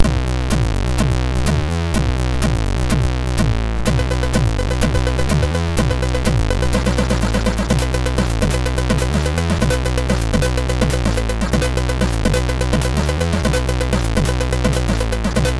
Beat Diz, MOS6581R3; hvsc

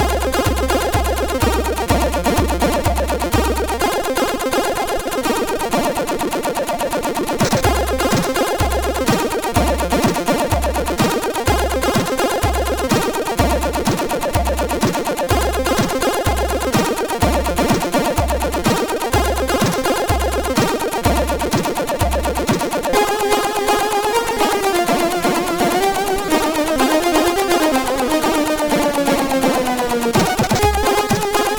Bass, CSG8580R5

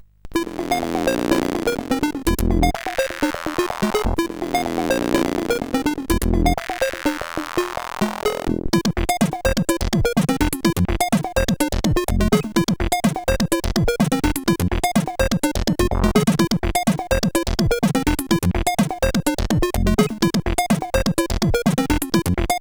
sid, CSG8580

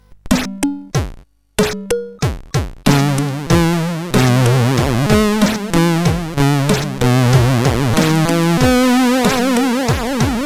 sid, CSG8580R5; Soon Coming tune 1

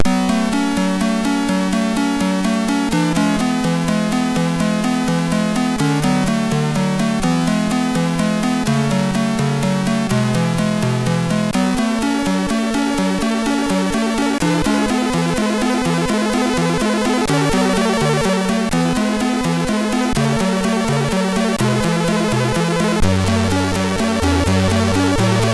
Ballad, MOS6581R3

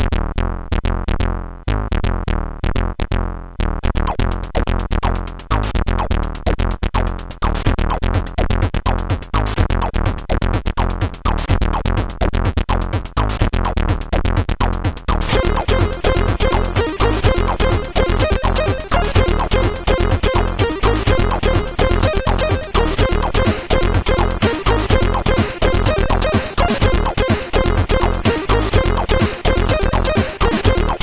sid 8580; CSG8580R5; Iron Bomb

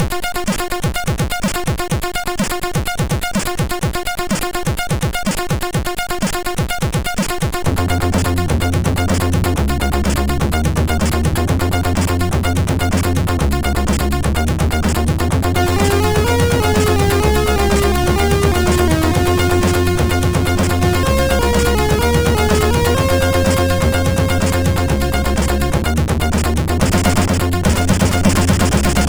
intro, sid MOS6581R4; Party Demo 2 intro

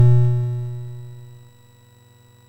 Frantic, MOS6581R4

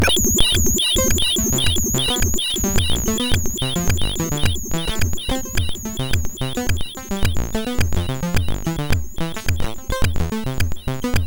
sid, MOS6581R2; Yeah

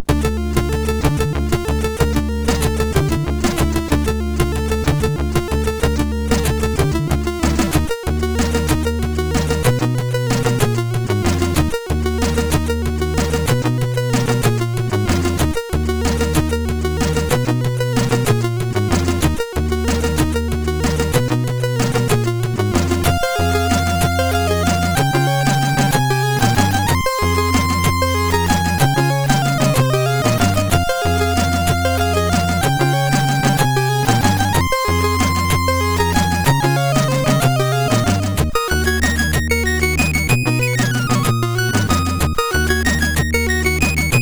Waltz, MOS6581R4

